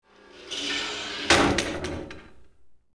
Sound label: TC DOOR, door abandoned; PC Gamer IT CD 40; zones